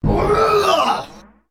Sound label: Shouter Shout